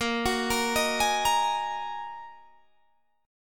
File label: A sharp 7th Suspended 4th Sharp 5th down Guitar Standard; arpeggiated; JGuitar